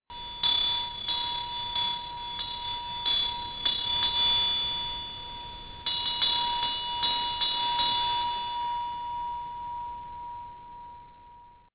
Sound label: Bells Small, tibetan bells dorjes